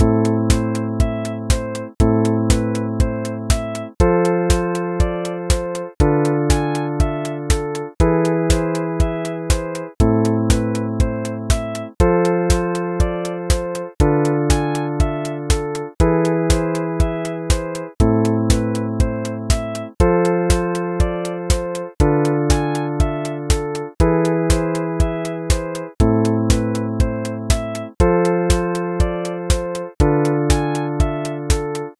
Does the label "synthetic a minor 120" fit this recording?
Yes